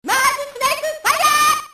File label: snake fire; mars